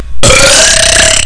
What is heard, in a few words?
burp